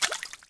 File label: WET; PC Gamer IT CD 49; UrbanChaos